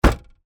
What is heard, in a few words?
Car Door Shut